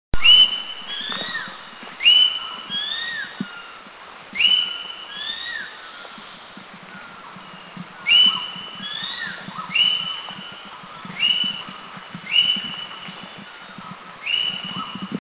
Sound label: Green Magpie; Eaglenest; India